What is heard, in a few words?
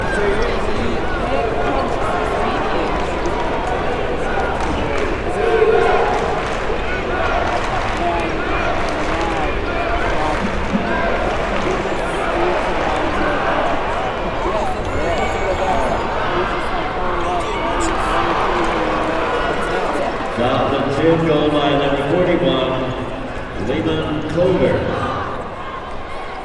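Arena Crowd Noise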